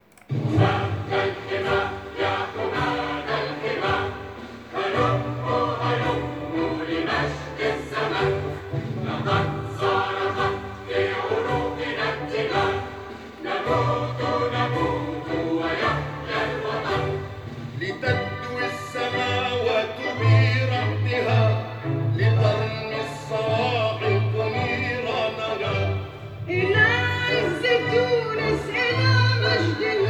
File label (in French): Hymne nationale relookee